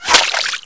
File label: hit water